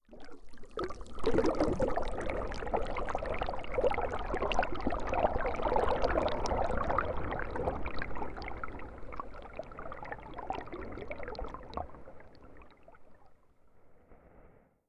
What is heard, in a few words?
Swash Zone Noise